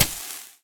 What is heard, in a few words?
Warrior Acid Attack Hit; We Are the Dwarves